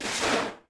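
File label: metal slide medium; objects; Star Wars Obi-Wan; XBOX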